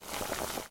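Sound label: rustling short annoying noise of water; shurshashij korotkij nadoedlivij shum vodi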